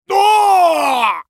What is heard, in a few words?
dying; Pantheon